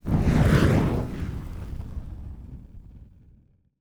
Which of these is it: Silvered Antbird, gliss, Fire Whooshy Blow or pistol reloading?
Fire Whooshy Blow